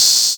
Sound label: Cymbals HH Open; Vengeance - Essential Clubsounds Vol.2